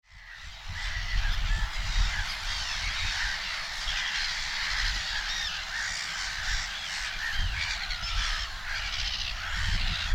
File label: Black headed gull; پرنده کاکایی سرسیاه کوچک